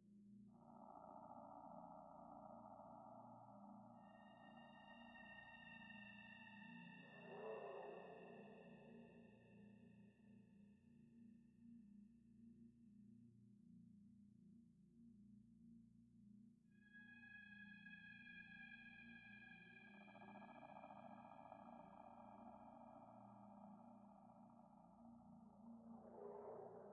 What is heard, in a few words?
INTRO MUSIC SHORT; Semblance